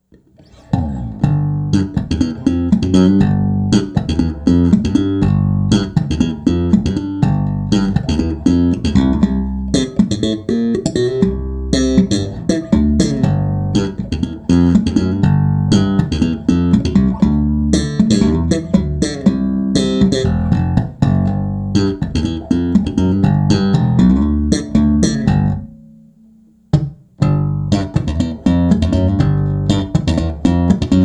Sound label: slap bass; olympus